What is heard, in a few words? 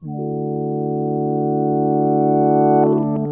stop, Motorola